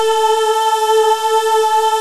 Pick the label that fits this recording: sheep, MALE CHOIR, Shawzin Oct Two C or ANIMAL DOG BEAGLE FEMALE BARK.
MALE CHOIR